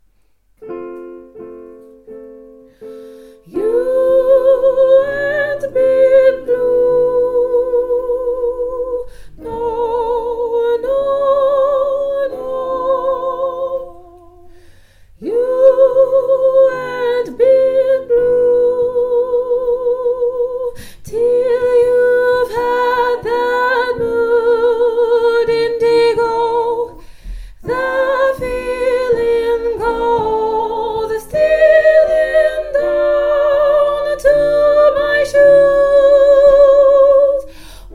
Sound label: tenors